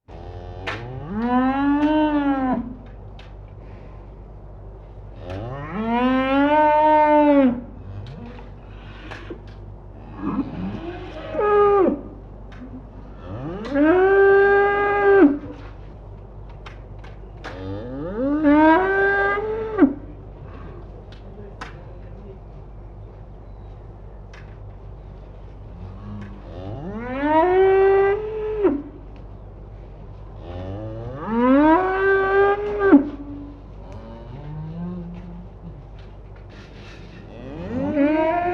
korovi na ferme

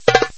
bongo perezvon bistrij korotkij

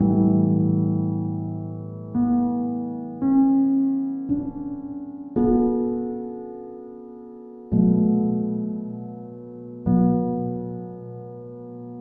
fortepiannaya petlya

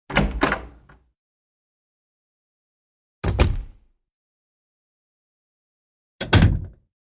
zvuki derevyannih dverej sborka

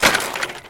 korotkij zvuk sborki oruzhiya

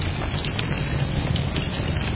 pozhar shumnii monotonnii korotkii

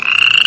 kvakanie malenkoj zhabi

